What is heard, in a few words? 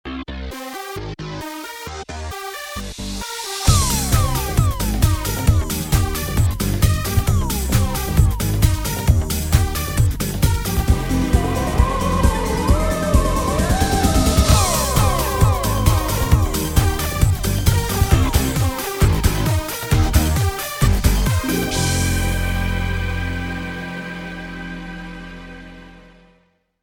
remix ringtone; The IT Crowd